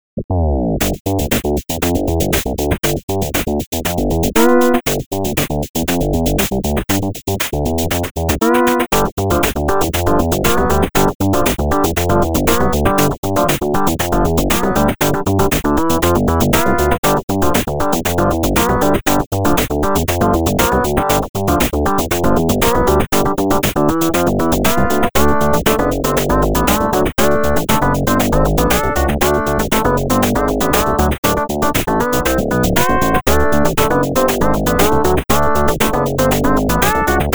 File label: funky